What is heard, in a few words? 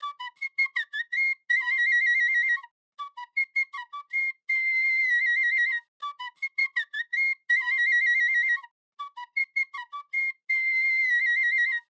Piccolo Flute 160bpm G